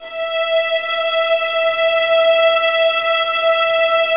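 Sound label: 2nd violins sustain e5; Sonatina Symphonic Orchestra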